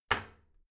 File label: toilet seat close soft, badezimmer; haus-garten